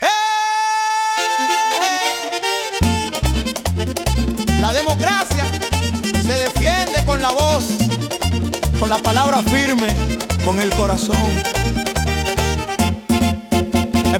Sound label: Merengue